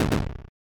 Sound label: taiko